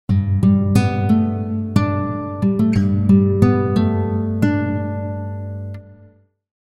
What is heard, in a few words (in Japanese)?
アコギ